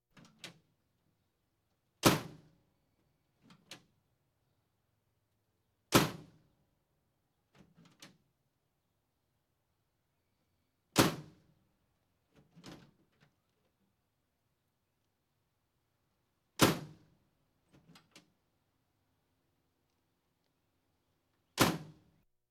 Police, Fire engines

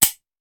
Hand Gun Foley; Sound Ideas; Guns

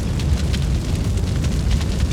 Fireball Fly Loop; Destruction

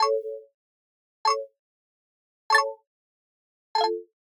Arcade Click Neutral; boom library; The Interface

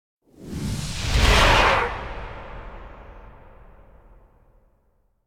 Whoosh Harsh Coming Air; Hollywood Edge; sound designer's toolkit v2

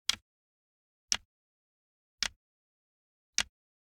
Generic Click Wood Tiny; boom library; The Interface

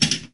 Pinball Slingshot Ball Eject

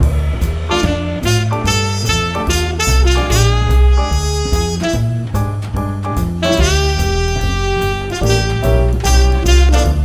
Medium, Jingles; 44th Street

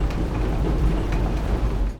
Wheel

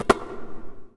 Basketball Rebound

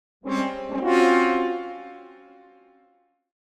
Horn Fanfare Victory Signal - Derisive; Hollywood Edge; sound designer's toolkit v2

